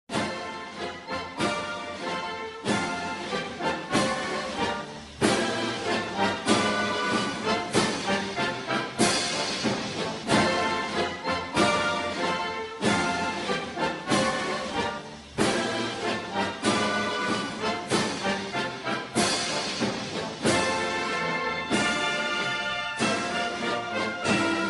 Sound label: Anthem; Radonian; March of Technocracy